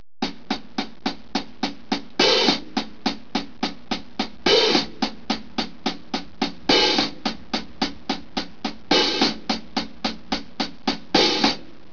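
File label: rock drm, rock drum; 8bit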